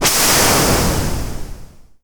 encounter, magic

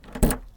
pull key

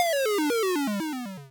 death

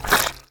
dressing; horror

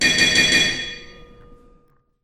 ending bell

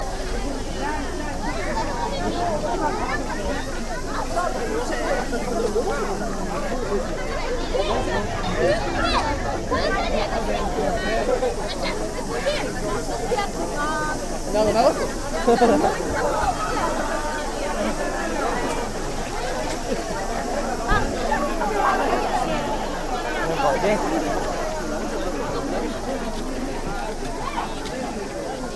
town summer fes

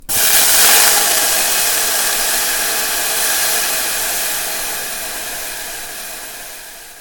roasting butter; cooking

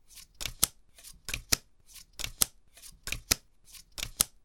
deal cards

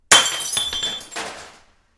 breaking a glass

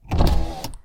power window, car

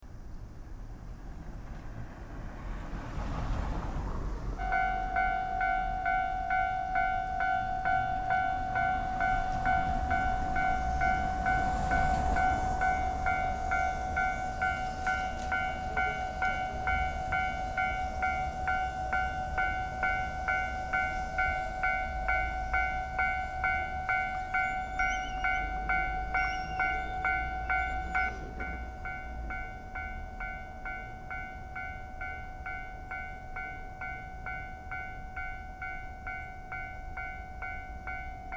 trains passing

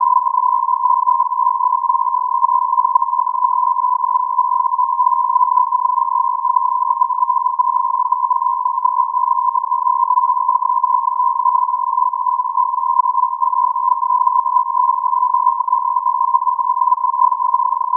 1000hz noise; horror

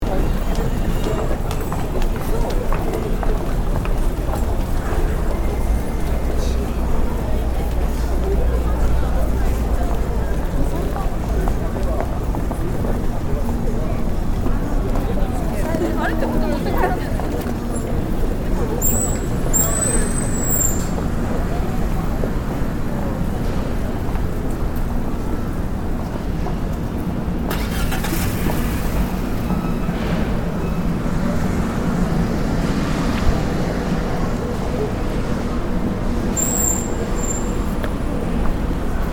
downtown night

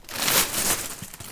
lift trash bag

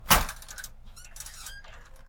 locker open

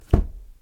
putting a book

